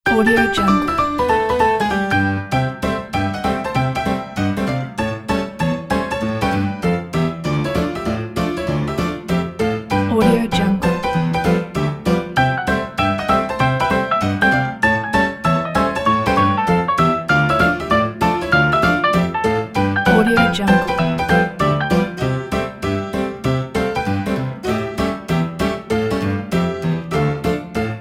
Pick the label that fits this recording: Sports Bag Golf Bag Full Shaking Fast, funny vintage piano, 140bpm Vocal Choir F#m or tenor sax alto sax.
funny vintage piano